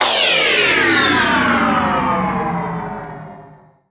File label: FX Sweep Down; Vengeance Samples